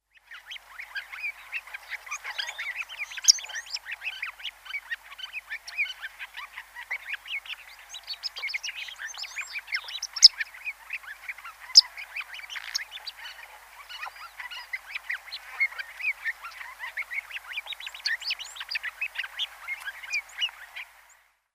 African Silverbill song

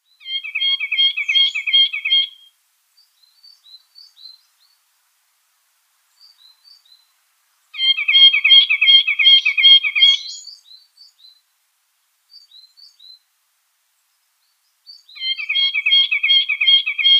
Algerian Nuthatch song

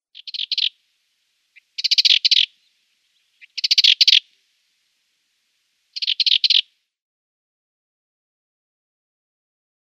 Sardinian Warbler calls

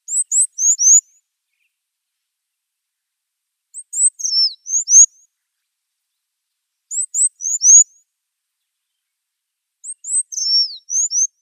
Red-cheeked Cordonbleu song

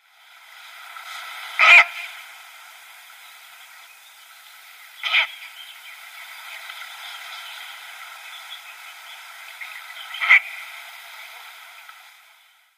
Ural Owl calls

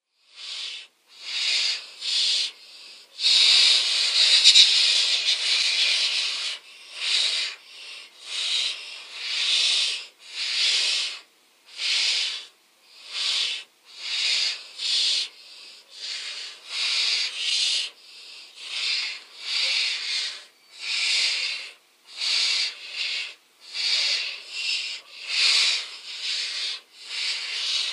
Little Owl nestlings

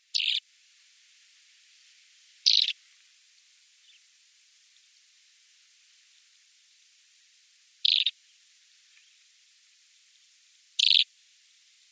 Lesser Short-toed Lark flight calls

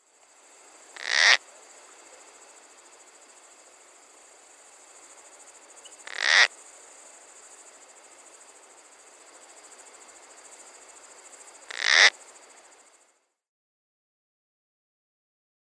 Marsh Owl calls

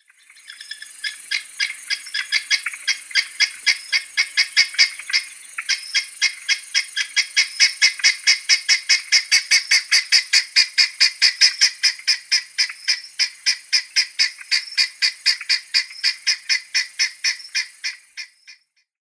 Helmeted Guineafowl calls

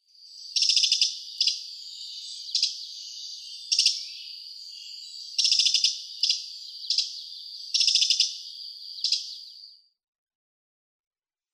Winter Wren calls

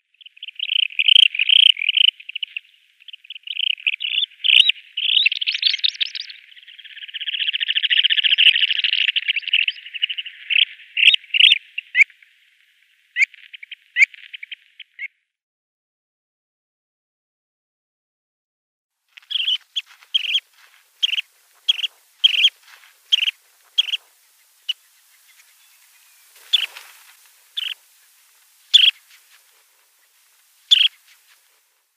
Curlew Sandpiper calls

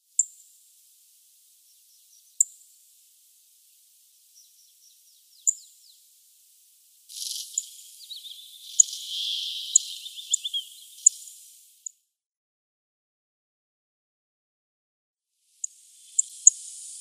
Rustic Bunting calls

